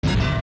steps iron boots land

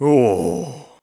Ohh; Gaepora (Zelda's father): "Ohh..."; Skyward Sword

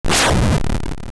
Eye Shield; Vaati forming a shield of eyes; Vaati Reborn

